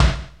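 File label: RX7 heavy bass drum 2; Crypton - DrumBase5000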